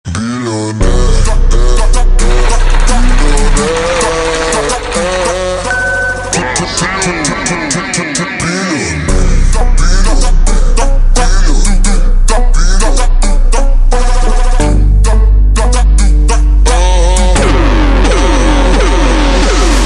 Phonk Ultra Slowed; funk

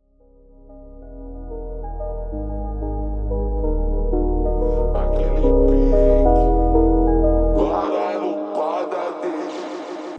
slowed, funk

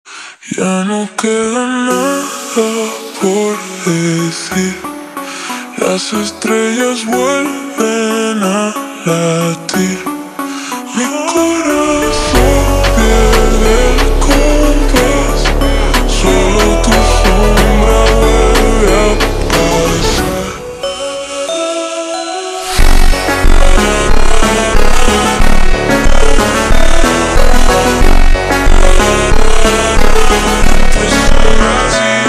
Funk ultra Slowed

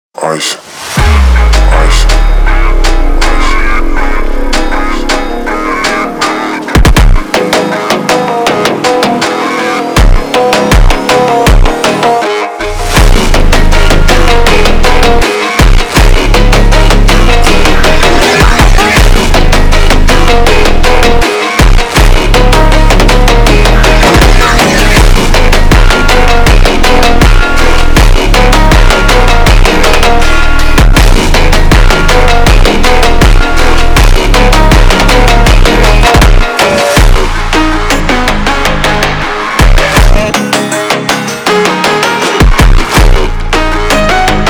funk super slowed

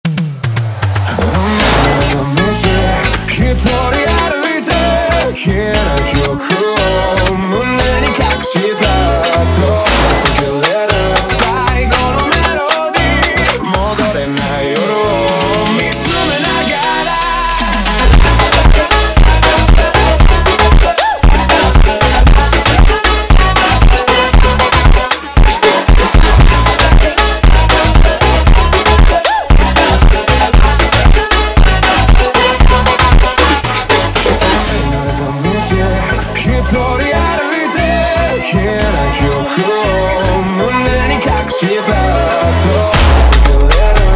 Funk slowed Reverb

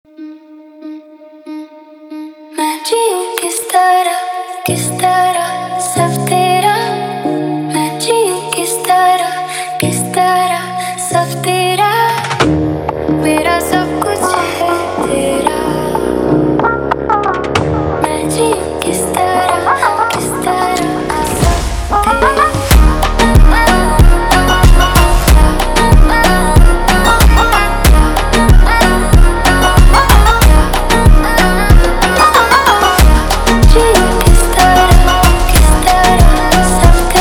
Slowed Reverb, funk

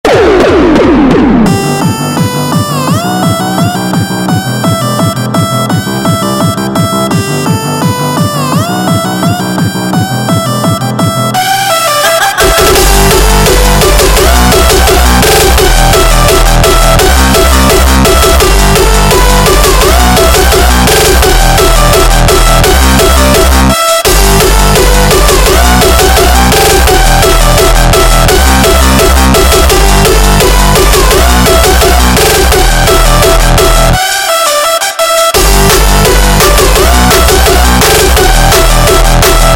Hardstyle

